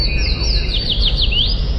bird chirping; simple messages

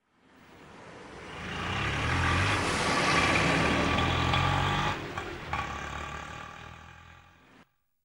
bus and tuk tuk fade away; dyslexia

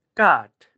wood; magnet

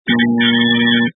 beep; grade5

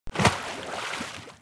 splash sound

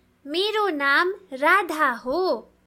flute; grade1; nepali; kurakani; playtime sounds

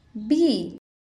bee

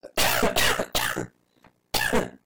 tuk tuk accelerating; dyslexia

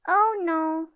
incorrect

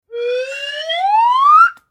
slide up

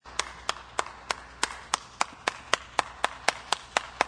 clapping; grade1; sound sci

